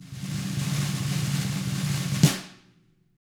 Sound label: TAMA BR RL, Drums; ILIO; Double Platinum Drums 1